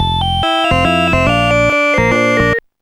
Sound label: jazz two; Instant Music